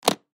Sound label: Briefcase Unlock Latch; Miscellaneous Office; Blastwave